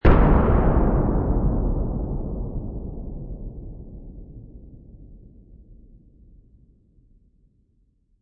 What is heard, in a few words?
Explosion Blast Dynamite, Moderately distant dynamite explosion, large blast partly muffled; Moderately distant dynamite explosion, large blast partly muffled by distance and terrain; Grenade Sound Effects; LynneMusic